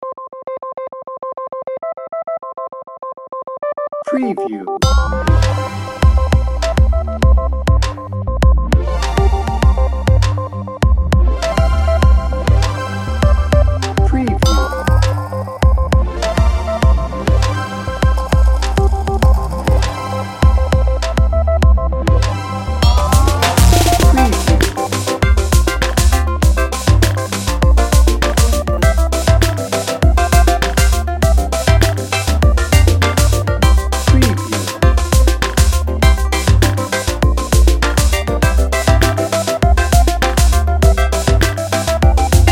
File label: electro motion